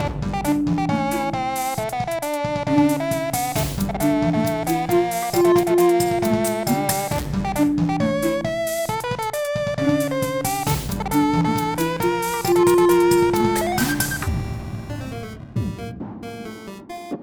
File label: action sequence test; gamebreak